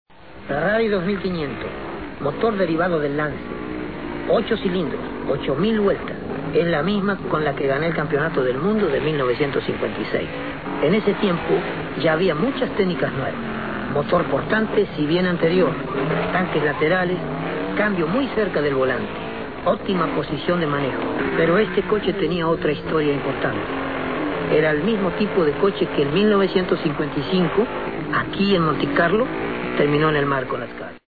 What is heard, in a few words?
ferrari